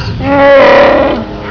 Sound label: chewy cry